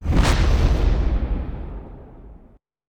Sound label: engine warp; Star Trek Adversaries; macos